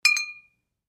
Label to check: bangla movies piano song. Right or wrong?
Wrong